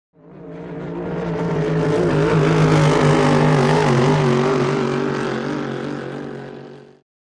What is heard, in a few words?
Power Boat By Fast, off shore power boat